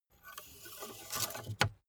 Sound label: car bmw e46 exterior oil rod insert